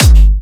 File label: Jump Kicks, Bassdrums